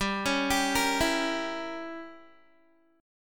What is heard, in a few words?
G Diminished 7th, Guitar; Gdim7 arpeggiated